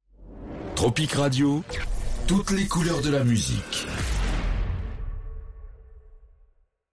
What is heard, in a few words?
Radio ID; Tropik Radio